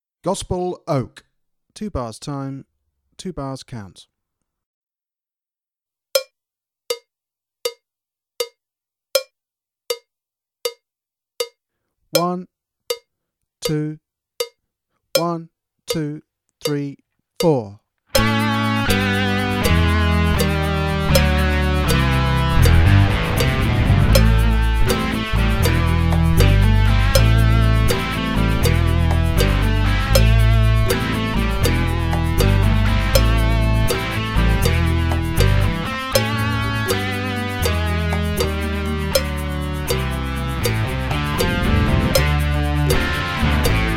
Gospel Oak Backing, drum kit

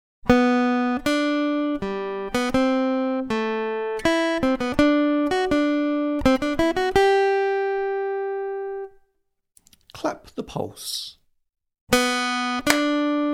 Aural Rhythm Test, acoustic guitar; Grade 6

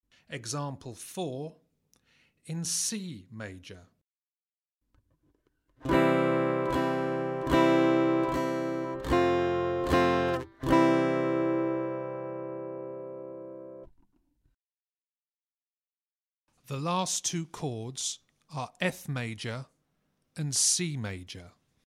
Aural Harmony Test, acoustic guitar; Grade 5

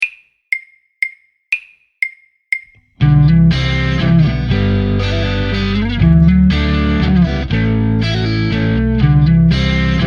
Waltzing Walz, drum kit; Performance Mix

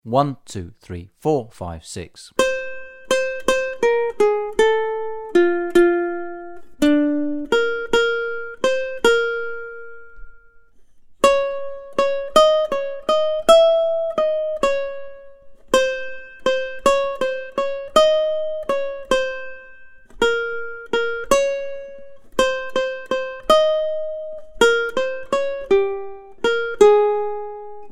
Accompaniment, ukulele; grade4

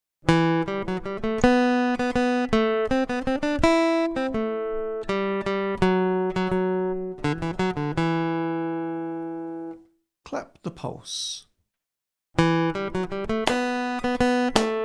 Aural Rhythm Test, acoustic guitar; Grade 8

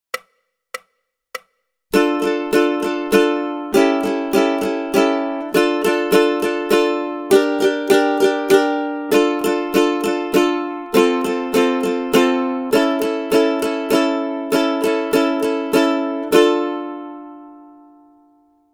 rhythm study, ukulele; grade1

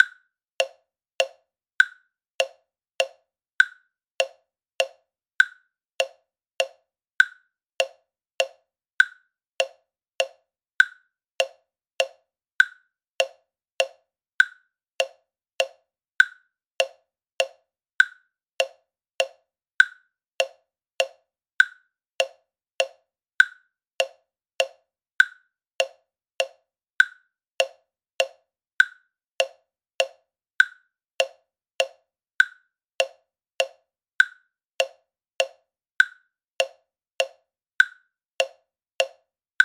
Click Study Jacks Back Click, drum kit

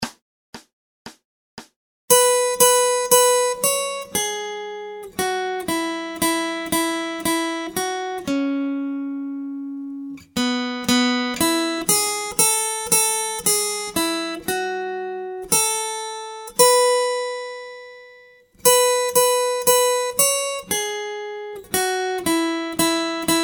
Acoustic Accompaniment, acoustic guitar

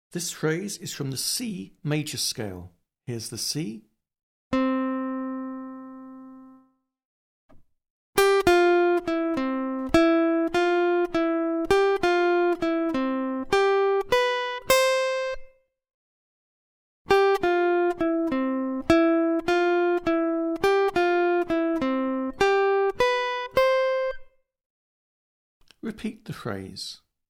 Aural Pitch Test, acoustic guitar; Grade 8

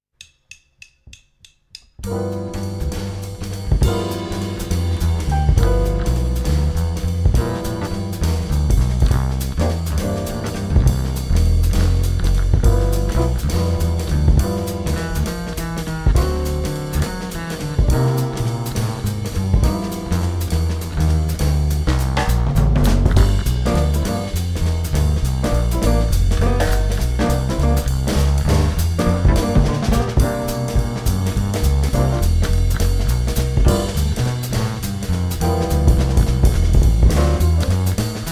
Jazz Waltz Blues backing only 4 choruses

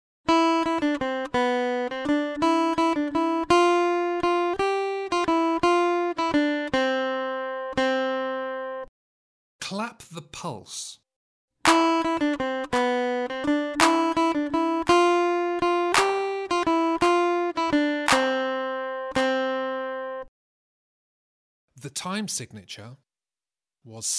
Aural Rhythm Test, acoustic guitar; Grade 5

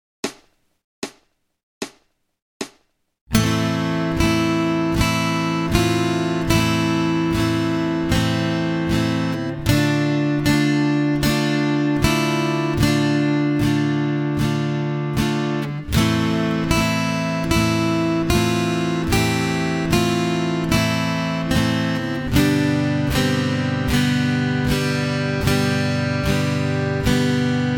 Acoustic Accompaniment, acoustic guitar

